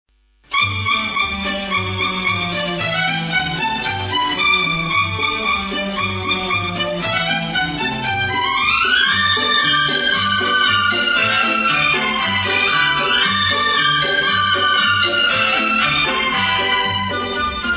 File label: theme; childrens favourites